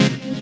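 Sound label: chord dit, Stabs; E Lab; XTc Files of House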